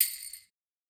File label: Percussion B Hit; 095 Ebmin; Hip Hop Exotica; Big Fish Audio